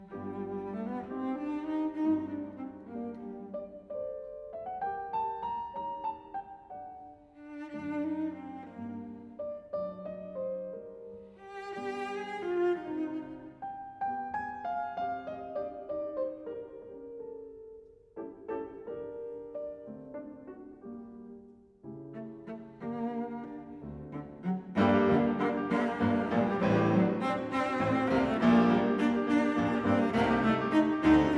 Sonata for Cello and Piano in G minor, Allegro molto